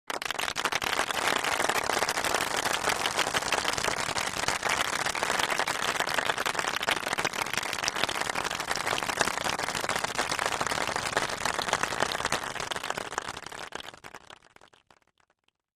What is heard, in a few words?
Nice Presentation Applause